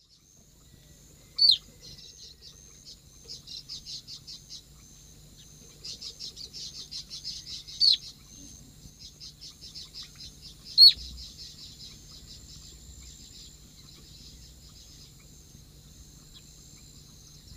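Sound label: Laterallus melanophaius, calls playback; S. America; Eastern Lowlands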